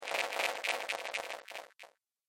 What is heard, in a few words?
Sci Fi Factory Interference Sound